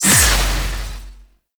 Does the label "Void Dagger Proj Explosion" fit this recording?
Yes